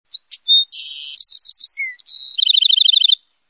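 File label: Kanarejka, canary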